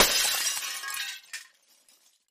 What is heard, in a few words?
Glass Crash; windows & mirrors